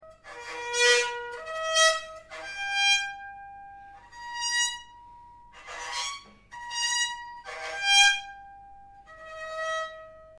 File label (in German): Trumscheit